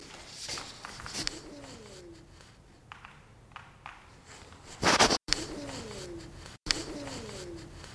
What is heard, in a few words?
Owl